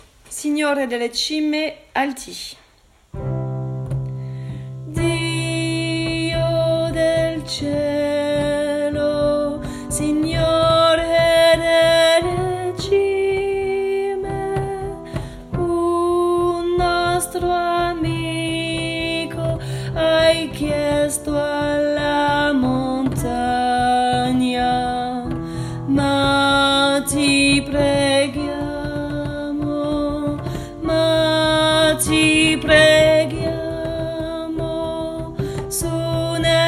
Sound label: Signore Delle Cime Alti